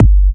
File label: Kick; WAProd Free Kick Samples 2018; WA Kick Pack 3